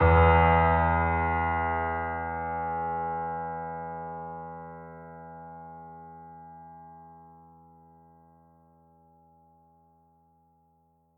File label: piano theme modular hypothesis; The Detail ios linux macos windows gamerip 2014